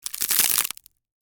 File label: Crunch Basket